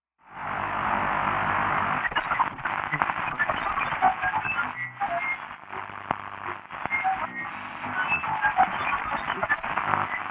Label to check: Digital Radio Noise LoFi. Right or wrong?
Right